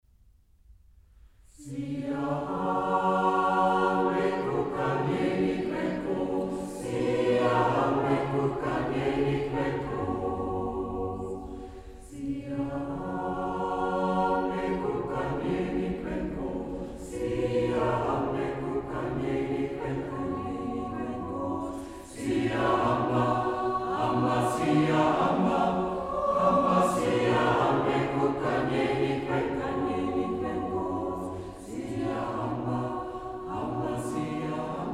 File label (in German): Tutti; Siyahamba